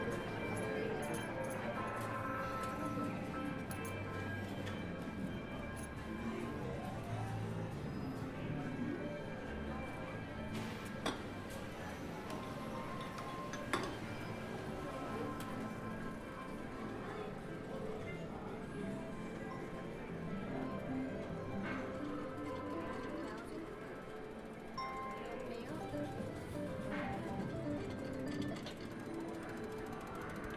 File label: bgm freespin